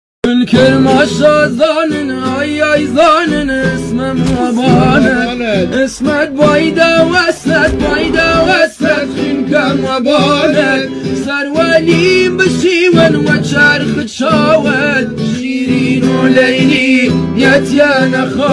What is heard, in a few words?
guitar; kol kermanshah; guitar kordi ghamgin